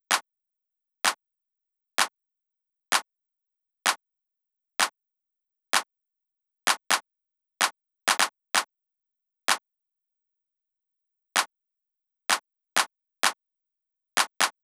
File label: Drum Loop 128BPM Clap; Loop Elements; VEC5; Drumloops; Multi Loops